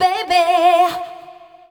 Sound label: VOC F, Dance Vocals, FEMALE